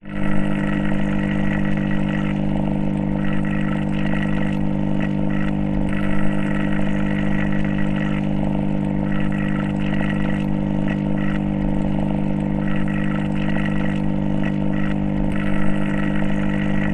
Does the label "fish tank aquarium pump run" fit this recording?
Yes